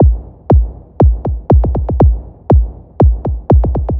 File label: Kick, Trance; Sonic Foundry (Sony Creative Software); Trance NRG VOL-2; Drums